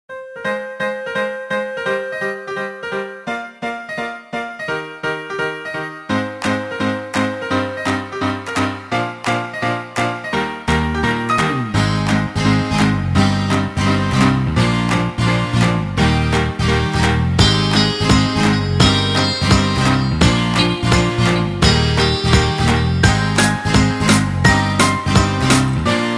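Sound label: Huawei G600, ringtone; Velcom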